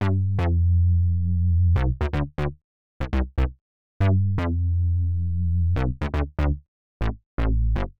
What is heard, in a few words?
Synth Bass G; PowerFX - Nu Jazz House